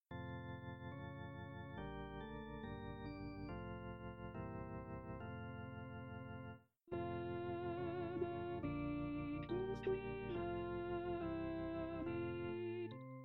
Benedictus Soprani; Missa Brevis; Gounod; messe

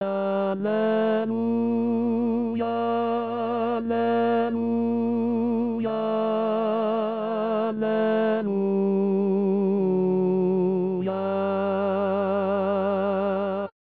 Alleluia Bizantino Tenori

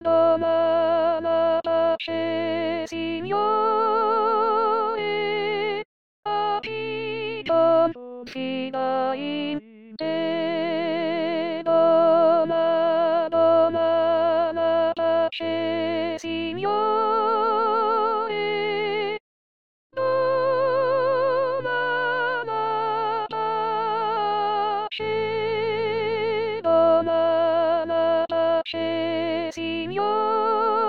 Soprani

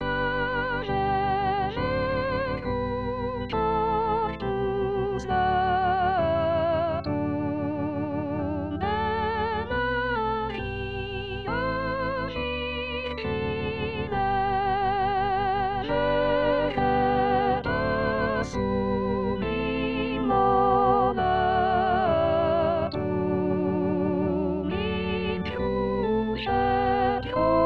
Ave Verum 2v Coro; Perosi; anno liturgico

